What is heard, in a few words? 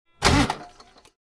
Car ignition failing